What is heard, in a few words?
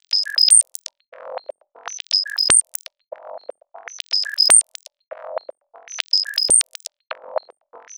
Synth Distorted; Electrocution